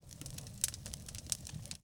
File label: fire; HunterZ G4; minecraft